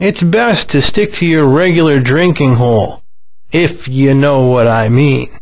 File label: drinking